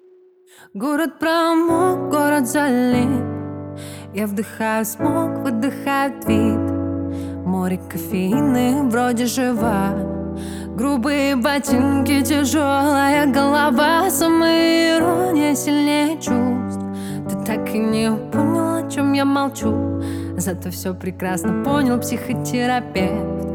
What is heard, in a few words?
Acoustic Version